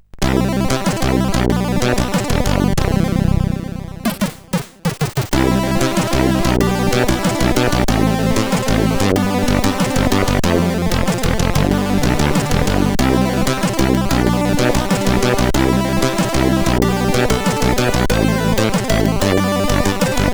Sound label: Peaceful, CSG8580R5